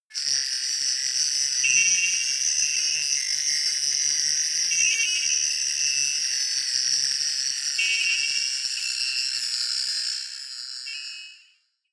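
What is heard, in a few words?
horror sonar, pads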